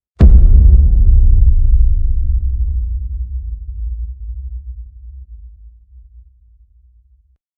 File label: Boom; Universe; pulse